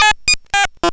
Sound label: Bleep Melody; AFG Bleep Melody; Cwejman S1; DevSnd Cwejman S1 - JK